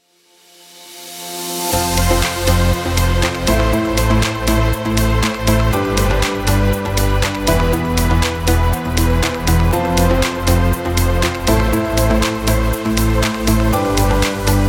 Promo

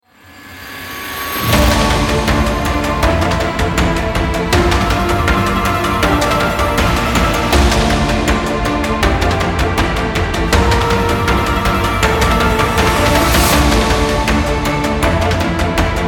Hybrid Trailer

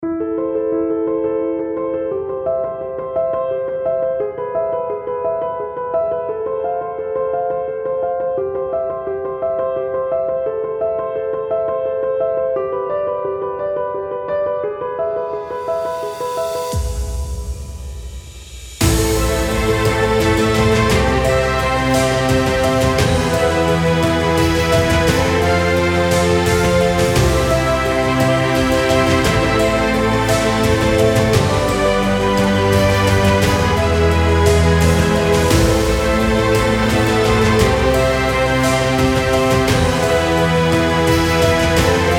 Emotional

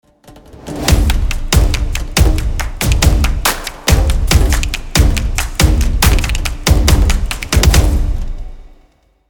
Energy Stomp

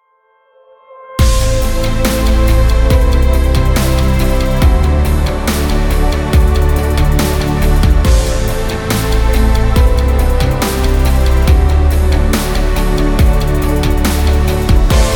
Inspirational Technology

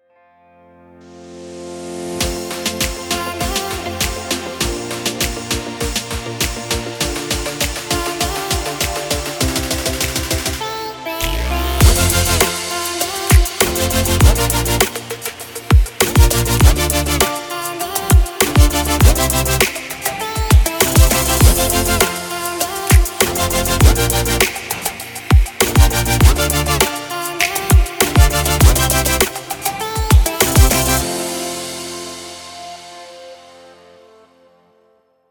Uplifting Electronic

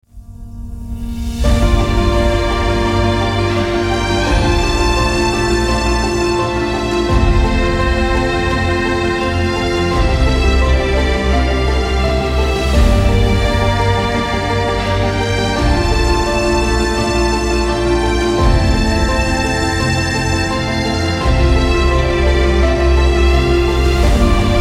Sentimental piano

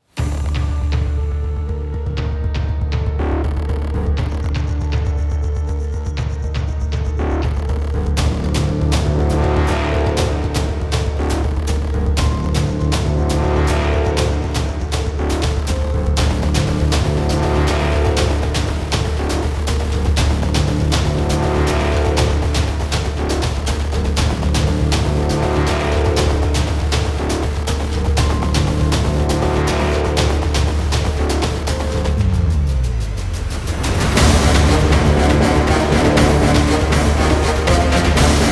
Epic Score

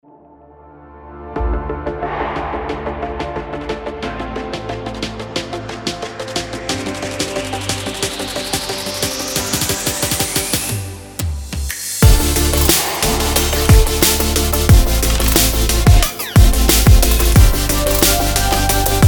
Future Bass Sport

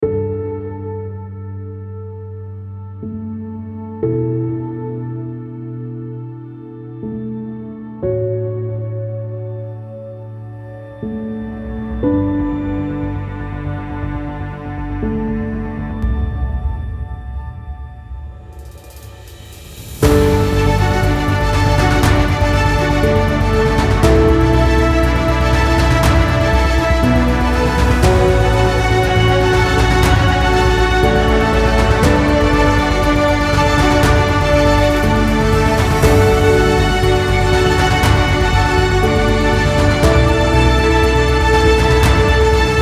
Cinematic Inspiration

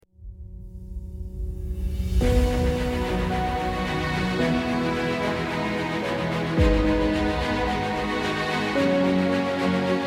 triumphal victory

